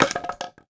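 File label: Log Stone Hit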